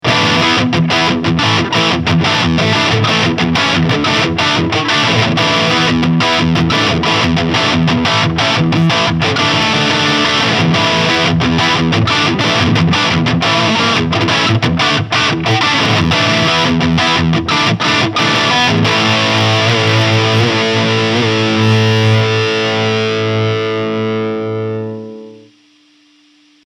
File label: Sun Valley Super Shredder Exotic FR Ziricote Lead